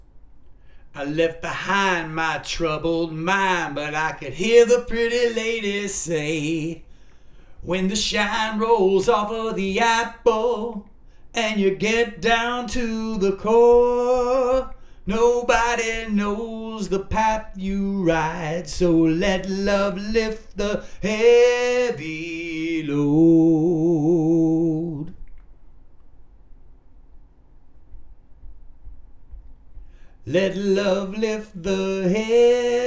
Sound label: vocals